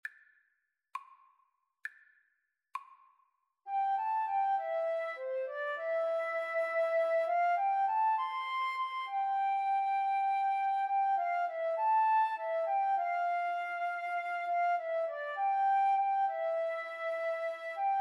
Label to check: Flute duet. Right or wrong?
Right